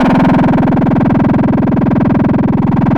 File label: SFX, Drum & Bass; Ueberschall; Drum & Bass Resonance